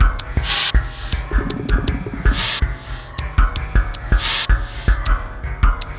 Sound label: 80 LP, Industrial Loops; RISK